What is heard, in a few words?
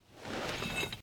reload